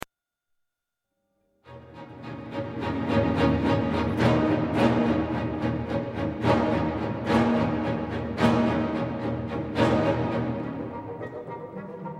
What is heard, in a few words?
accented